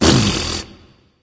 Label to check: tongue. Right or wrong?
Right